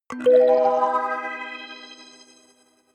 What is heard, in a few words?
notification kalimba magical octave up